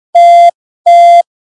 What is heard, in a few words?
beep beep